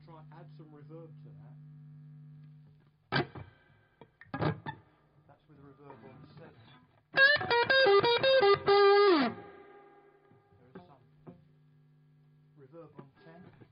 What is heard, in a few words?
lead add reverb